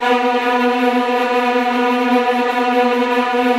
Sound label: STR TREMOLO, Strings